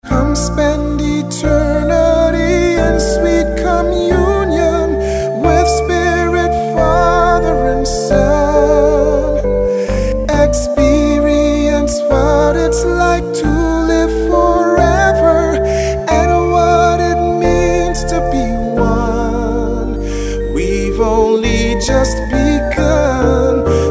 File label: ringtone